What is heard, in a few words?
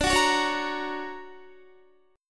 D Augmented Major 7th down Guitar; D+M7 strummed; JGuitar